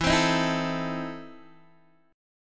E Major 7th Suspended 4th Sharp 5th, Guitar, Open D